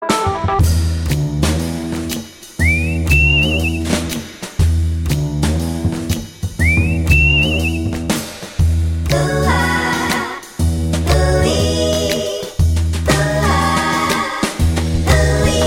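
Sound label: jazz and whistle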